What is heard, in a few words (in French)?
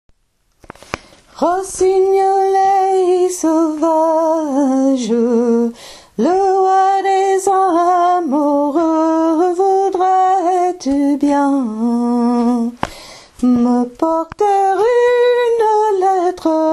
alto; rossignolet